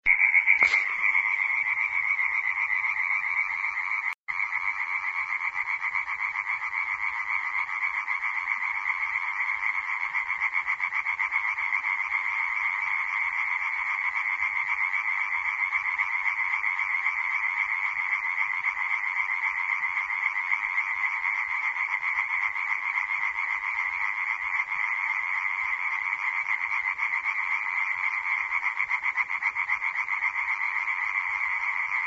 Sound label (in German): laubfrosch; nachttiere